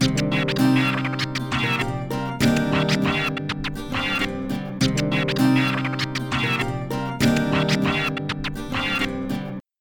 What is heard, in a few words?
Mix III Arps Turntables AC Guitar Dry; PerfectSpace